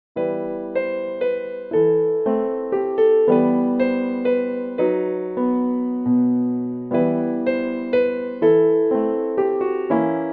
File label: E minor piano accompaniment; Mary Poppins